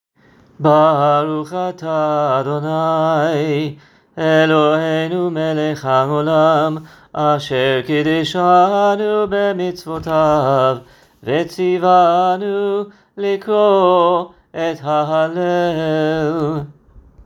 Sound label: Hallel Blessing, Sephardi; Rosh Hodesh